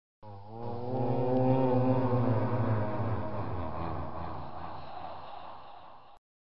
moan; STALZONE; minecraft

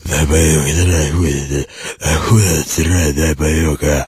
zombie idle; STALKER; customnpcs

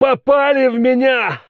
military hit; STALZONE; customnpcs; stalker

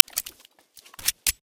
gsh18 reload; STALZONE

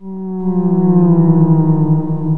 moan, ambient; STALZONE

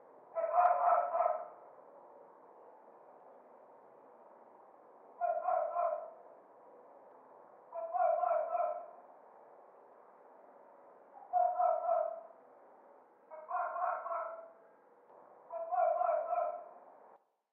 Small ambient dogs; STALZONE

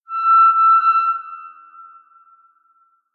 Small ambient metal squeak; STALZONE

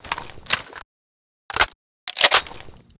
wa2000 reload; STALZONE

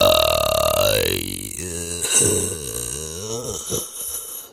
zombie die; STALZONE; customnpcs; stalker

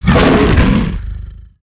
chimera attack hit; STALZONE; customnpcs; stalker

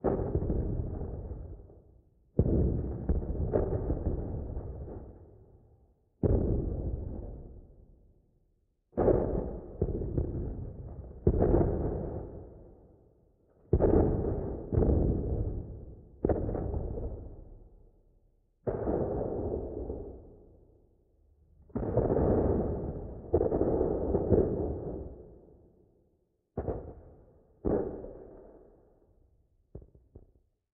Small ambient shooting; STALZONE

